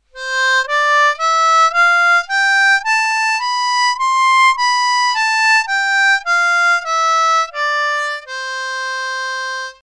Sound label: central octave major scale